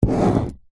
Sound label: office pen ball point draw line short paper on plastic table